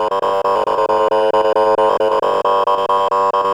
Kaossilator gated (130BPM); Korg Kaossilator; creative gate; musicradar

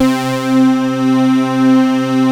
JUNO 106, PADS; Masterbits; Vintage Voltage

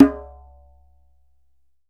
DJEMBE, African Drum

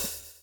swing

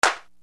Yamaha RX7 CLAPS

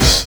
PULSE; Univers Sons

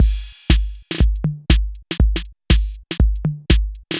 Synth Loop, BPM120

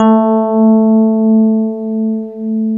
FM Rhodes; Club-50 - Foundations Roland

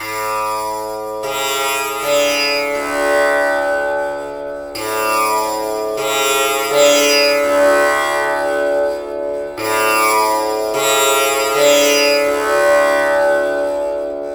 TAMPURA; Spectrasonics; Heart of Asia

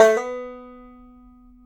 B 3, BANJO NOTES; Sampleheads; Whole Lotta Country 1 & 2

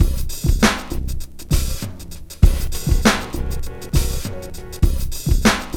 BEAT, Hip Hop; E-MU Formula 4000 Series Vol. 1; Hip Hop Nation; Beats 95-99bpm

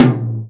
tom, Real Drum Kit, jungle kit

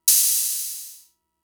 Open Hat, Drum Machines MFB-522; Fricke

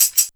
TAMBRIN, TAMBOURIN; Big Beat Samples & More; AKAI

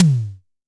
Tom, Vel Mid, Tama TS-206

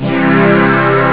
d50 string; steel; Amiga Soundtracker Sample Packs

